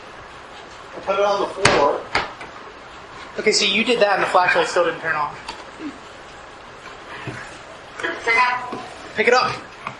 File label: Attic Phasma Box; gill house investigation